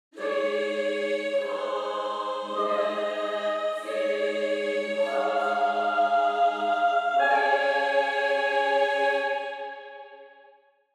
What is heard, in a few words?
Angelic choir angels; divine blessings await